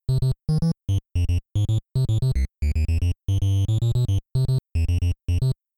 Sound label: triangle; Duck Hunt